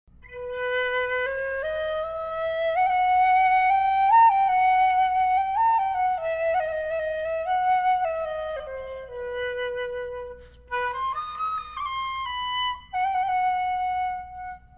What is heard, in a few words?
Arabian Small, Arabian Flute